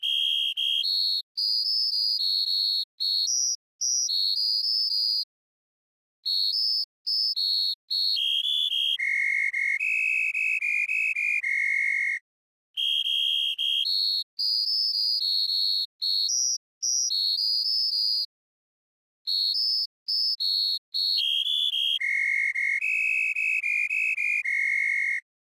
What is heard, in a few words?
Carol Voice; Winky